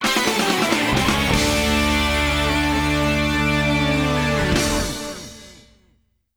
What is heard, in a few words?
Goal; Desert Grit